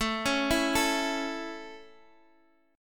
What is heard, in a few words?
A Major, Guitar, DADGAD